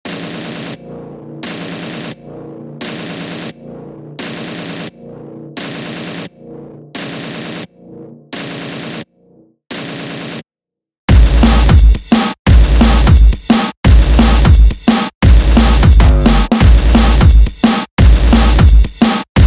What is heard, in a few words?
extreme metal